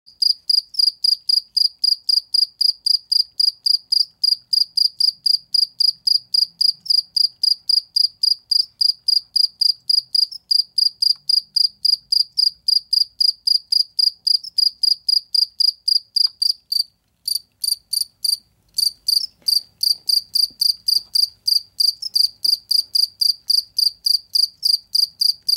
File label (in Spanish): grillo; seglares